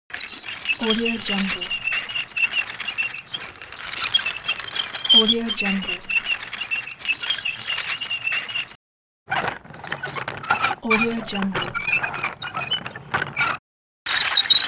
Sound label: creaky wooden rotating mechanism